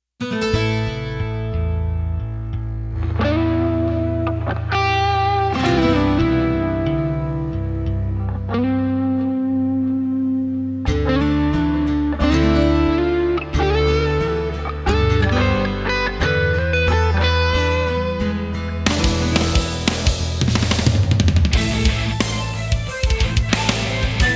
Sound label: Combat Theme